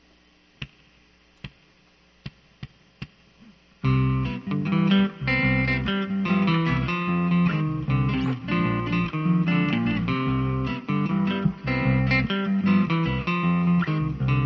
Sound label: modern Simple Bossa